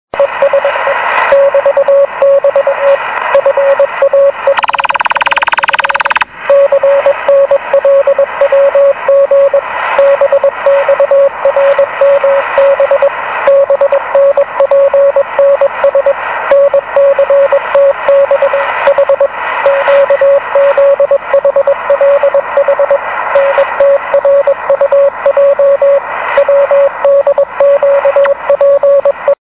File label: Russia 14996 kHz USB; RWM